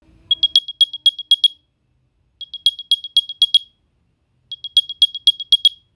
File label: airpods pro 2 case sound